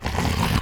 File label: bite charge; Apotheorasis